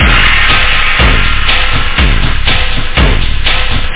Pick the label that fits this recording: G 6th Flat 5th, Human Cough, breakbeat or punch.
breakbeat